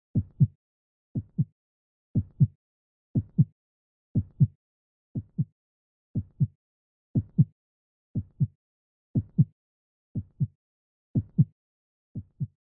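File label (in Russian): Сердце бьётся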